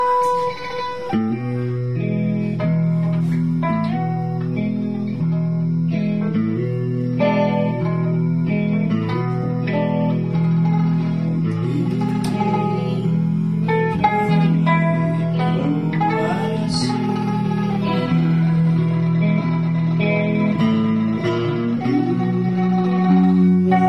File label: With Mandolin